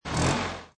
Rev Short; disney; cars; Luigi

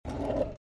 drawer short

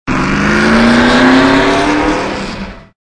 accelerate agro; mcqueen; Cars; tractor tip

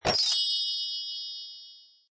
generic alert; toystory; toystorymania

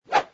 Whoosh; kickin it; bobby wasabi academy

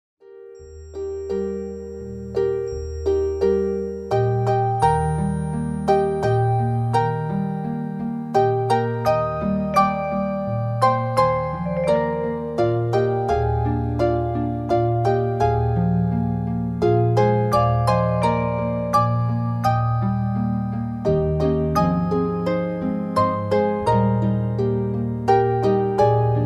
waltz no 15; baby einstein; disney

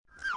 slide down; snow white

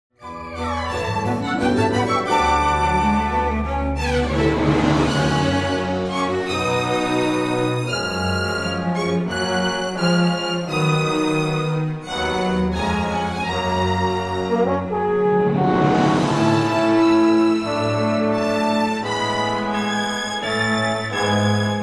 karaoke instr; princess; disney

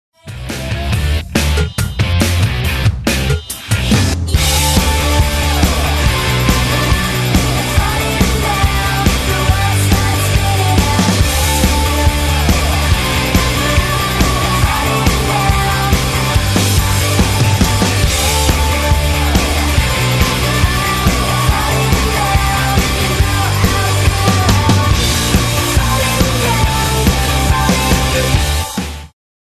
karaoke falling down; disney

